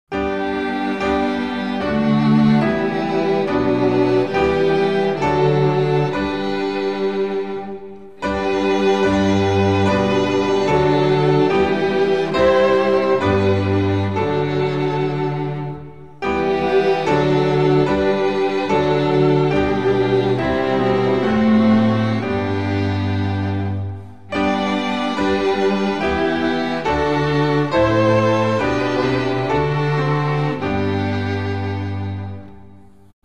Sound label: instrumental; hymns spanish